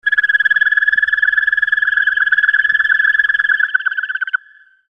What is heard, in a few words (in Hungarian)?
effekt ciripeles